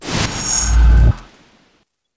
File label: spy tactical cloak; tf2